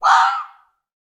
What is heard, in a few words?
animals fox red mating call single; sound effects one